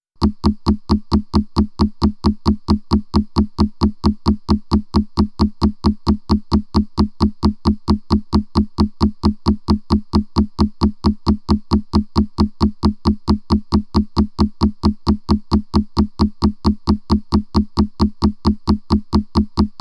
Sprech + Voegel Beats (Tape Beats); rauschpartikel

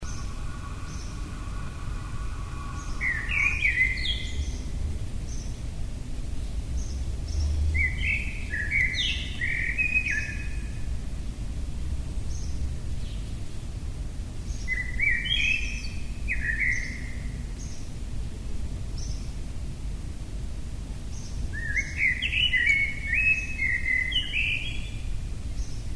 halb drinnen; Das Kleine Field Recordings Festival

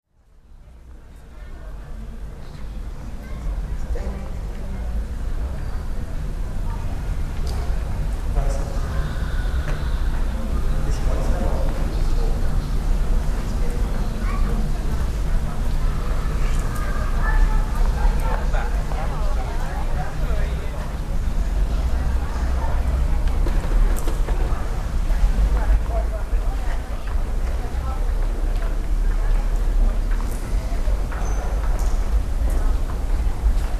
rauschpartikel berlin samples (flughafen), fieldrecordings; das kleine fieldrecordingsfestival

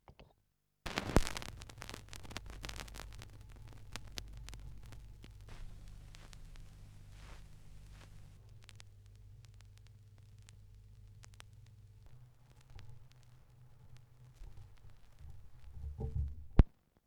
schallplattengeraeusche

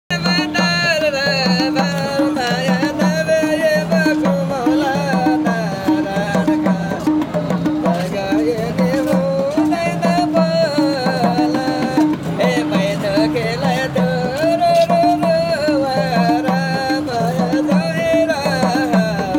Strassenmusiker; Putali Sadak, Bhaktapur Sadak, Khulla Murch Park; Boudha, Kathmandu, Nepal

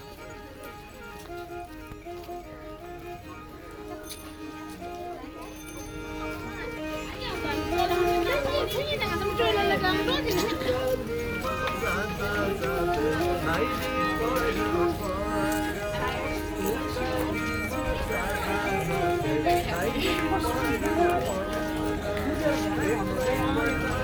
Boudha Strassenmusiker; Boudha, Kathmandu, Nepal

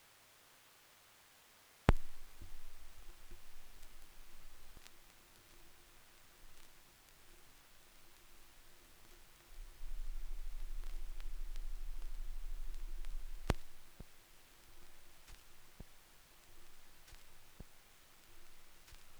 schallplattengeraeusche

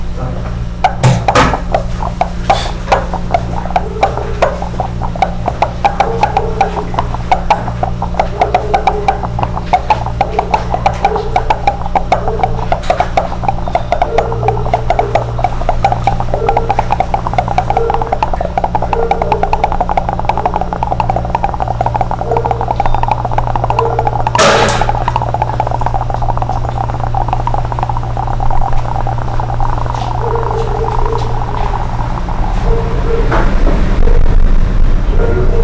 Glas in Der Spuele; O-Ton; Brasil

